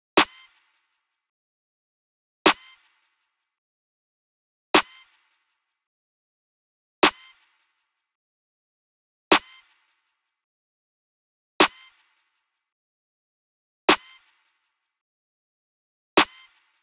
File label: Snare 105 Em Dry